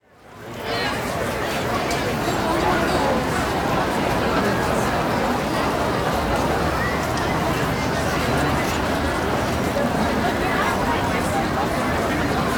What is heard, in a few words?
Crowd Outdoor Busy Pedestrian Mall, Walla; Sound Ideas; Walla Series